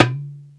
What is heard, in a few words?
dumbek; Greytsounds; EXOTIC PERC